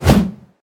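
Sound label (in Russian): ложный замах самурайским мечом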